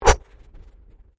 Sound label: Convolution Surge